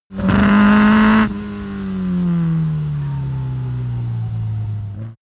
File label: car opel kadett short acceleration long deceleration